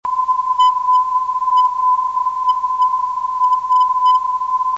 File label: iiis 313.965khz, ndb